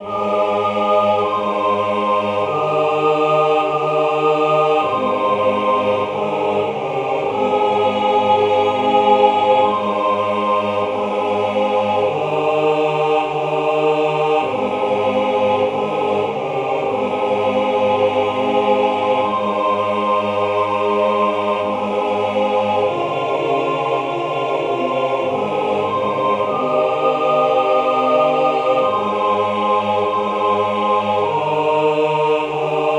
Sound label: hymn Rhythm Outline